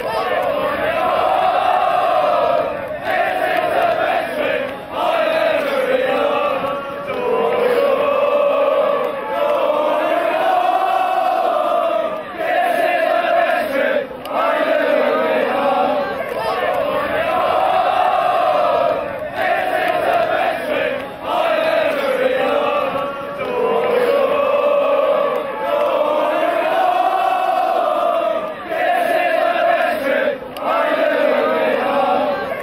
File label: galway united chant